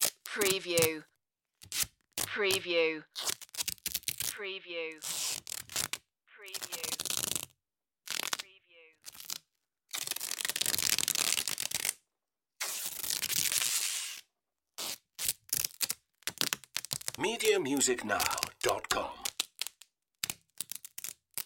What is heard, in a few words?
adhesive tape